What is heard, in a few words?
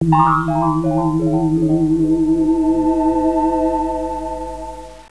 jingle